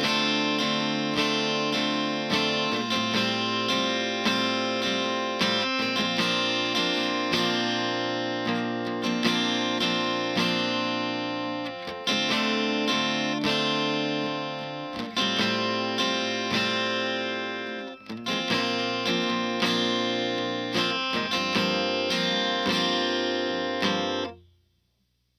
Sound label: Guitar Beach 78bpm D m; Chill, Chords, Pop, Rock, Soft; Loop; Melody; D Min